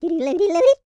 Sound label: YODEL; ILIO; Vocal Planet